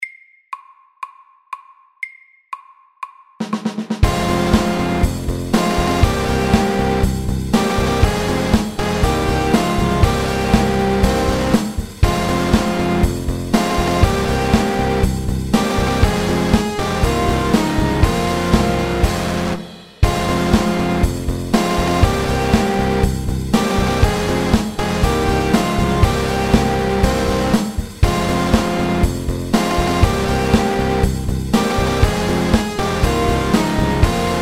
Triplet metal FORT

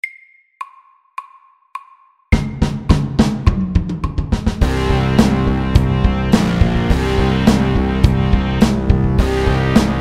Heavy metal MEDIUM

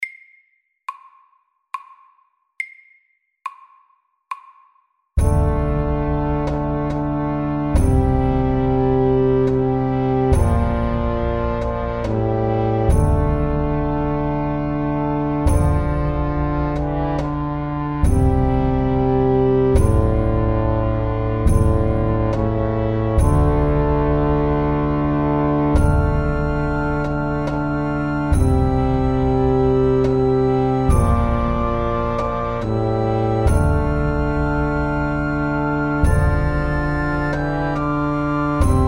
Hemiola SLOW

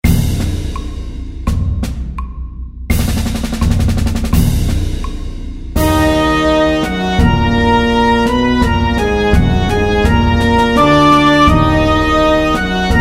March in C minor; SAKTE

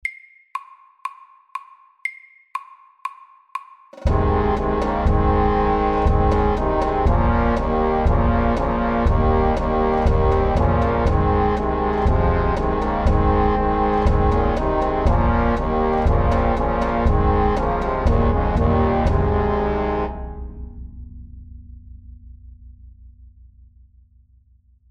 Ronde MEDIUM 120

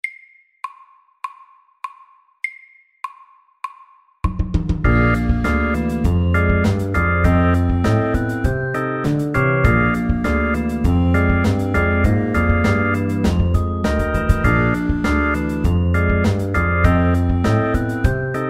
SLOW 100

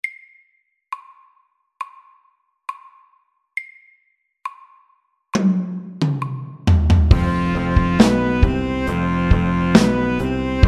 Have some funk SLOW 68

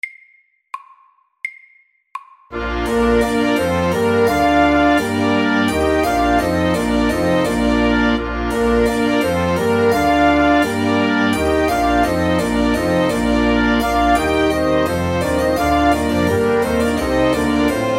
Bourree MEDIUM 85

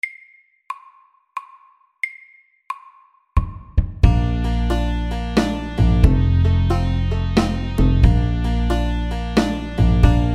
Country shuffle SLOW 90

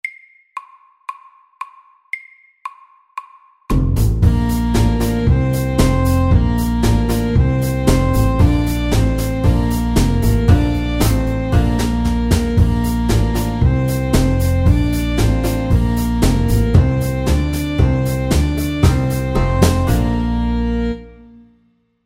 Square dance MEDIUM 115

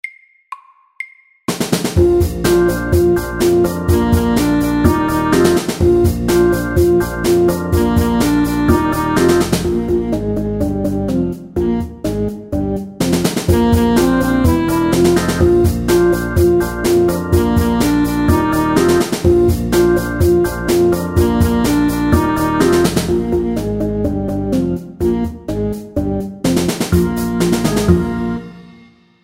Fizzy pop, 125

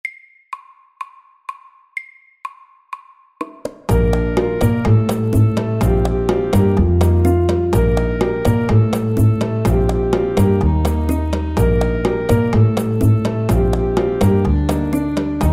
Spicy salsa FAST

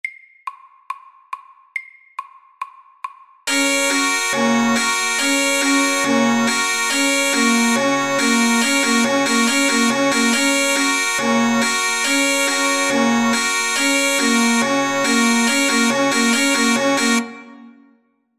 Alarm FAST 140

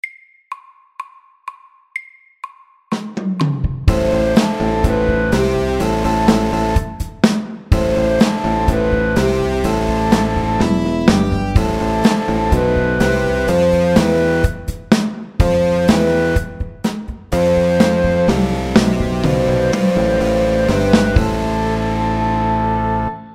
Metal rock FORT

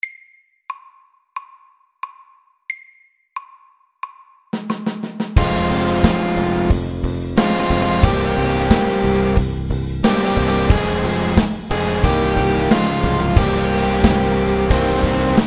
Triplet metal; SAKTE

